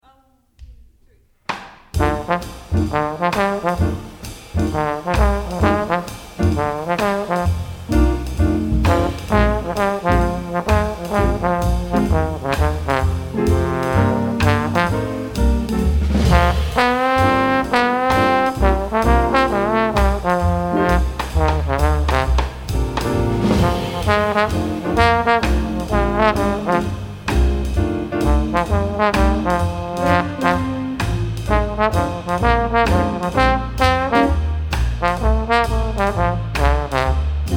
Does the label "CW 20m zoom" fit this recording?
No